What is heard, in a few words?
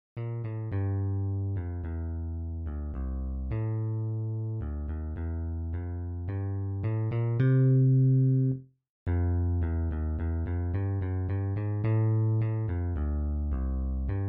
for electric bass electric bass only